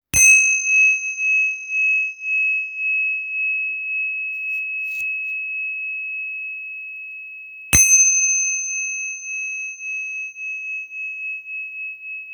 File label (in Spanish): Tingsha liso mi 271